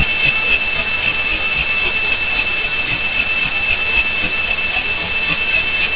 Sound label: home alarm